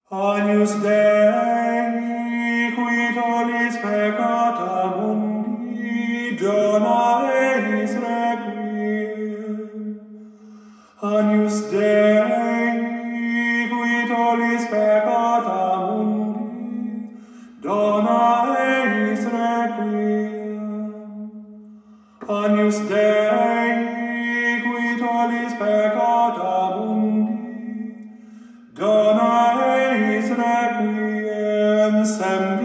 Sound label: agnus requiem; ordinary